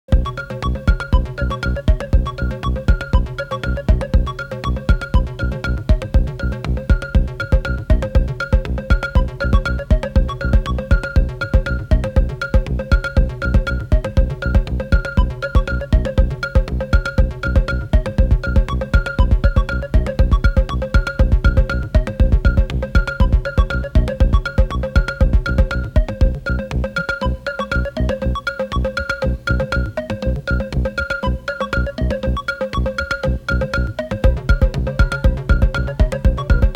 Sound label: Modular Synthesizer Ensemble; Zumtobel2022